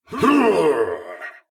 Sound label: Combat; Eshimal; SyrinScape; Snarl and Oraculum